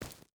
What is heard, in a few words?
footstep barefoot gravel run; Rust